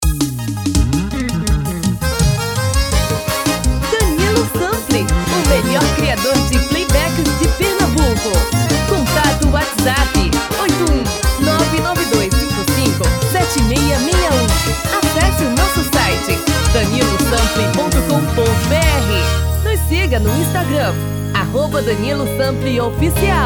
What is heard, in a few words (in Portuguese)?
FORRO dois tom abaixo